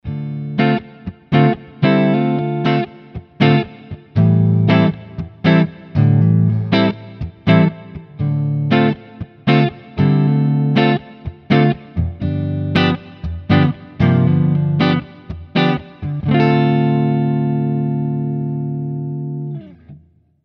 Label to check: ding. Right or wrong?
Wrong